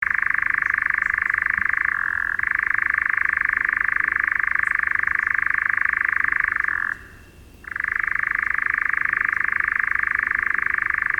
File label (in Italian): succiacapre; Canto del maschio